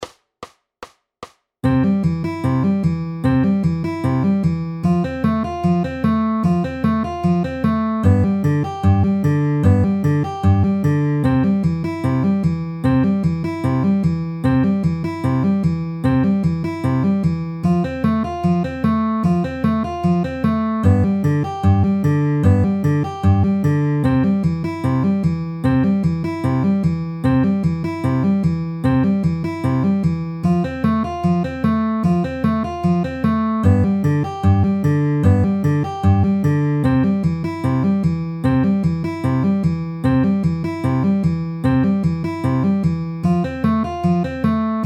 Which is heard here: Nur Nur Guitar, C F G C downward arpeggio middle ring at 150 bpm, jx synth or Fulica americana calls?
C F G C downward arpeggio middle ring at 150 bpm